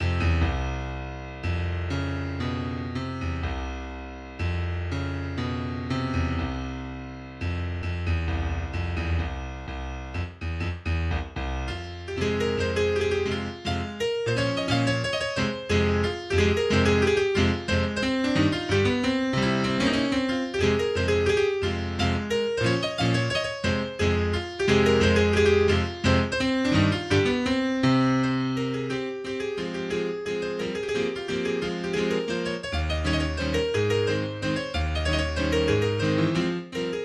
Mazurka in F Major Sotto voce; Opus 7 No3

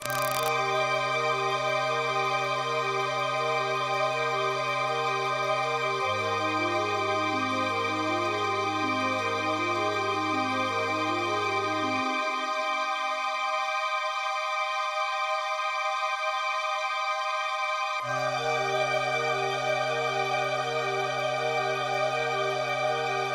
MIDI

